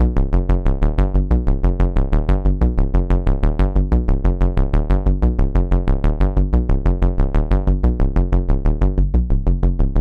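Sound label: Manual Filter Sweep; ACL System 1 Seq1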